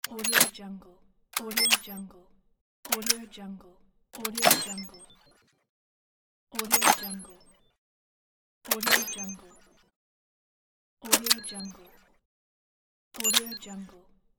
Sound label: game chest